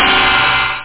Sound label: RIDE CYMBAL